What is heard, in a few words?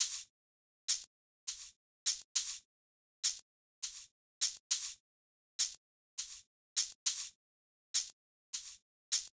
Shakers, Hip Hop Exotica, Drum Tracks, 102, Fmin